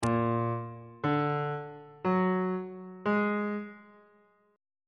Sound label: a sharp augmented 7th chord intervals on bass clef